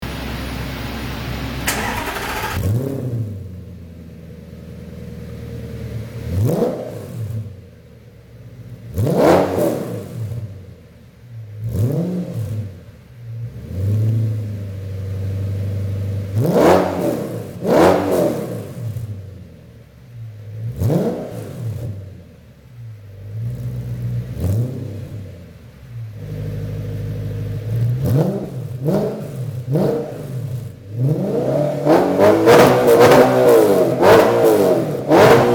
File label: Audi R8 V8 4.2